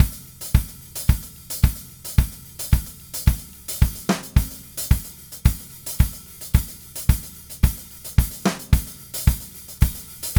Red Maple Groove 110, DW Red Maple Kit

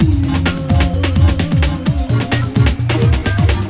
X MUH LOOP, Techno Trance; Music Loops

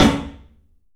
SNARE, 3-D Percussives; E-MU Producer Series Vol. 5 – 3-D Audio Collection; P.A. Kit

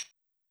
Tamb; Big Fish Audio; Marshmellow; Construction Kits

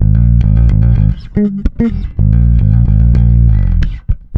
NASTY A#, bass; Spectrasonics; Bass Legends

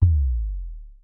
Synare Filter; Star Instruments Synare 3

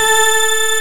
DIDI, SYNTHESIZER, DIGI WAVE

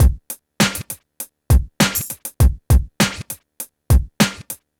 PHATT BEA, Hip Hop

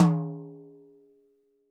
TD-20 Tom B, Roland TD-20 Toms

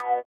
sitar, Indiatek; Spectrasonics; Metamorphosis

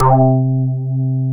SD1 ANAREZ, synthesizers; Soli-Music; World of Synthesizers VOL-1

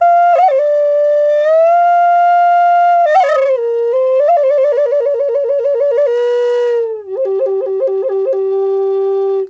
G WILD, FLUTE WILD; Big Fish Audio - Didgeridoo and Other Primitive Instruments

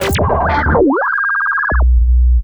WHIR; COMPLEXHITS; Rarefaction; Poke in The Ear With A Sharp Stick

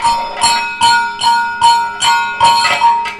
KATAK, Indian Traditions; USB Soundscan vol.45 - Indian Traditions; AKAI

